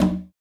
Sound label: African Perc